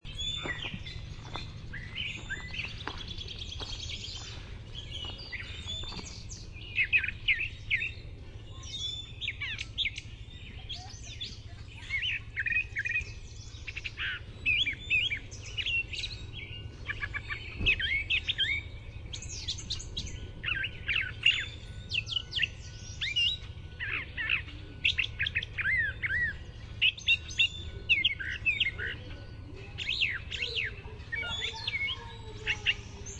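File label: gray catbird song, Dumetella carolinensis; Passeriformes Mimidae; WY; Glendo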